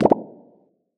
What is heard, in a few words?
cell Death